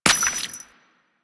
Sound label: Steps Water, footsteps; Warframe; Grineer Royal Jester; Foley